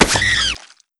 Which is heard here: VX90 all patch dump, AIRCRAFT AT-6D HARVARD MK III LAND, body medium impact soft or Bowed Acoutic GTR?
body medium impact soft